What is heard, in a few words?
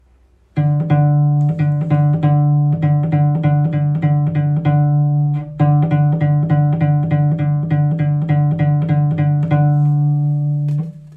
Cello pizz; Es regnet, es regnet